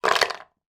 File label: toy baby toy wooden puzzle pieces drop on board